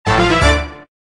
WIN; Sizzling Hot DX

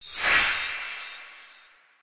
bonus symbol close; Magic Forest

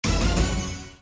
service special stop; Forest Nymph

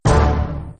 bonus; Racetrack Riches; megaboard

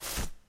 announces out; games; Corrida Romance; Wazdan

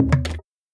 stop button; buttons; Xing Yun Jin Chan

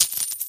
collect money; Hot Party Deluxe; Wazdan

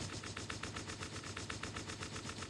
reels rotate; Magic Stars 5; Wazdan

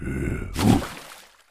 character walrus fishing; Fishing For Gold

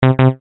WIN; All American Poker

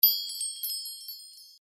outro; Shopping Fiend

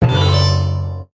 count up end; games; Book Of Queen

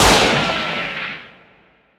tornado; Wild Guns

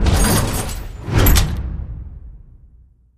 bonus golden key animation; The Golden City

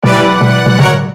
freeze; Dynamite 7

